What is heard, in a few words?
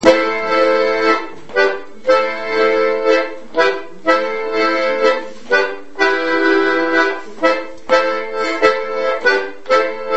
slide, accompagnement; A-B; O-Keefe s-slide; rencontres-2013